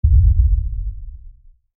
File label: LFE Hit, Lfe Hit Short Impact; Hit High Frequency Ring Deep Bass Pound Production Element Imaging Element Accent Transition